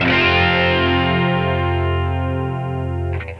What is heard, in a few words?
guitar strum F; blindmime; atticus